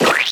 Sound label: Splat Normal